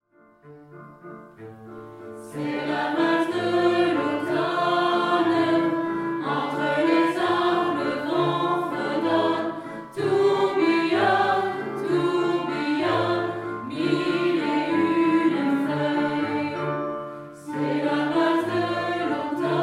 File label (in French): valse de l automne